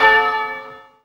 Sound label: LO REZ BELLS; AMG - Vince Clarke Lucky Bastard